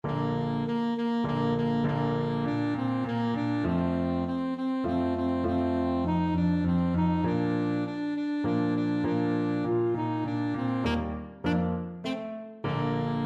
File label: fanfare, saxophone; corrette